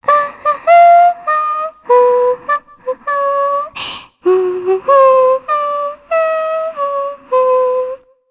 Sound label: Hum, jp; Shea; Kings Raid